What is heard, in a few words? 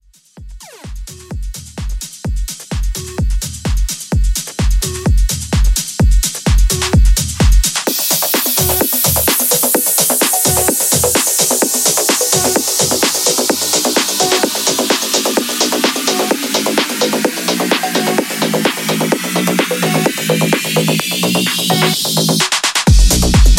Club Mix Intro